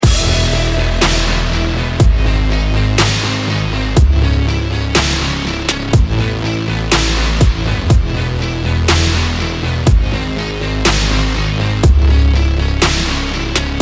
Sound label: INSTRUMENTAL RINGTONE